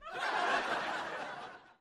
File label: Crowd Short Burst, small int